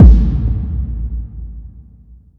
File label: normal hit finish; osu!